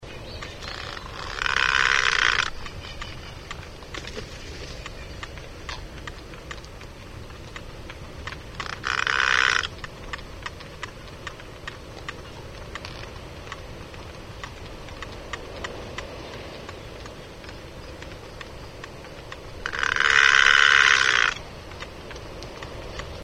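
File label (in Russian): krasnonogaya olusha golos, red-footed booby voice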